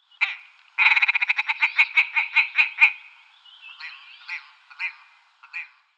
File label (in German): Moorschneehuhn Lagopus lagopus